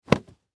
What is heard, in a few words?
Towel Whip Snap; Household; Sound Design